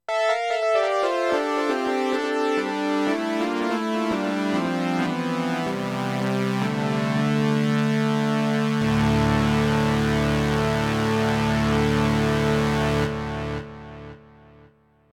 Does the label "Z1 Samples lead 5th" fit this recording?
Yes